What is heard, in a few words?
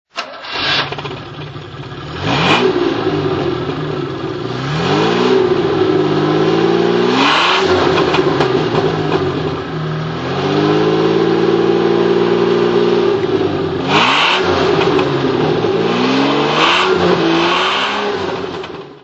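jaguar etype v12ots manual throttle, Engine sound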